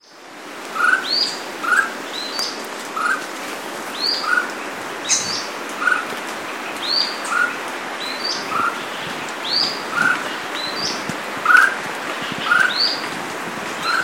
Chamaeza ruficauda callnote; N Cunha

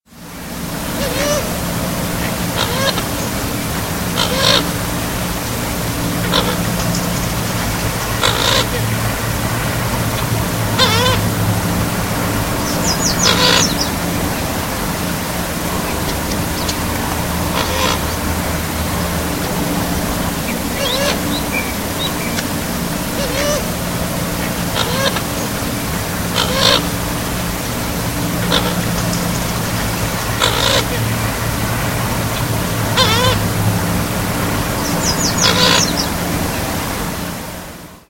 Fulica caribaea; Bubali Aruba

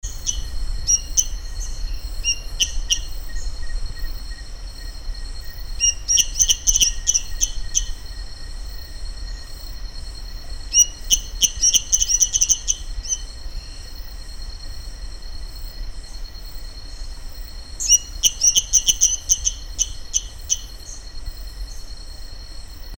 Dendropsophorus minutus; PN Itatiaia

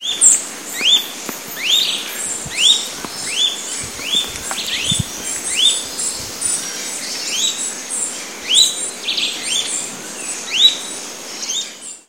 Sittasomus griseicapillus, Mongagua SP; Ag Campos

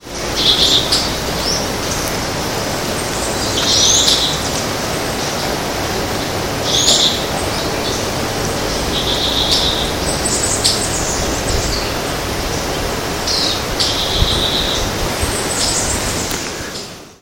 Euphonia pectoralis; Pco das Antas Mongagua SP

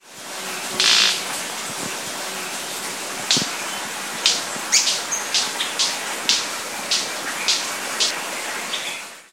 Manacus manacus; PN Itatiaia

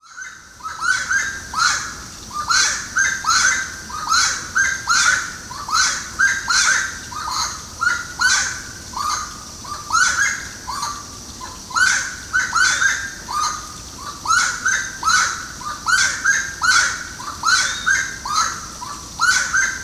Aramides saracura; JD Botanico; SP

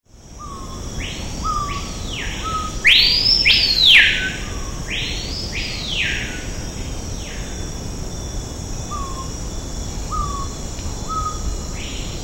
Lipaugus vociferans; Altazes

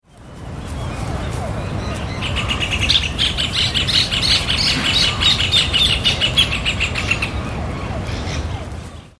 Furnarius rufus; Ibirapuera; SP

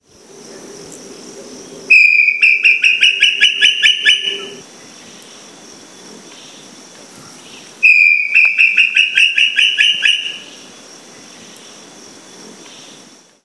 Crypturellus obsoletus; PE Cantareira; SP

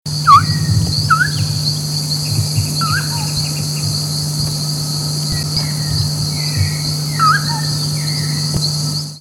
Lurocalis semitorquatus, PETAR; N Santana

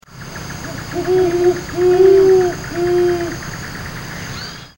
Bubo virginianus; Xaraes

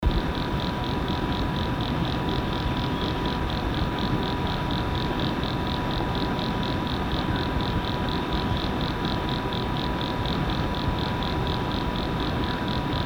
Brachycephalus ephippium; PN Itatiaia

